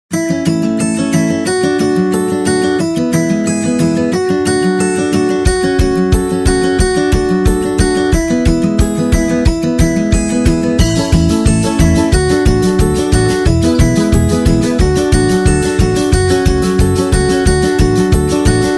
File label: Fun Comedy; cooking show